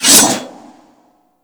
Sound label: Great Sword Swipe; Warframe; Weapons